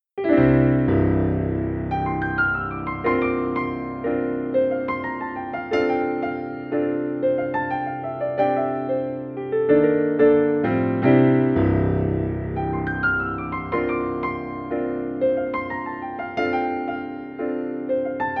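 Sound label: Italian Grand, stage piano; dexibell vivo s2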